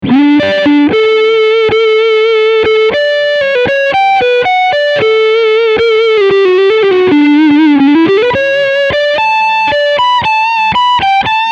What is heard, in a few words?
Leadsound Front PU